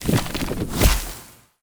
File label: Plant Trap Bite